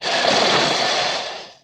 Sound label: Steam Burst Sauna